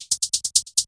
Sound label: Intro Maracas